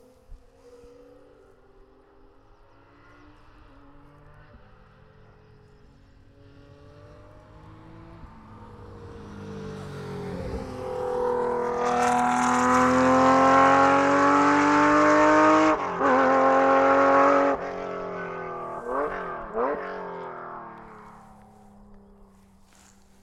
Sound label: Porsche 911 Gt3 Cup Car Approaching Corner